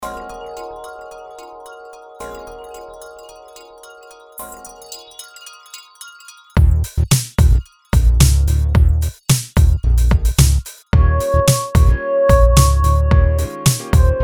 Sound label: jazz